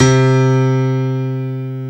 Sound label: PNO, Electrics, Grands; Club-50 - Foundations